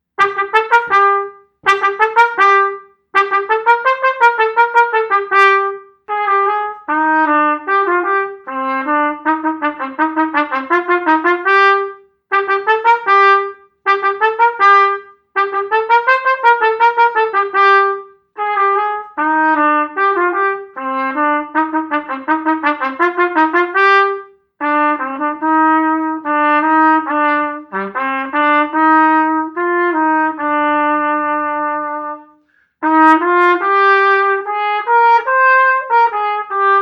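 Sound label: Scherzo Solo, Alto Sax; Solo Accompaniments; 12 Schrzo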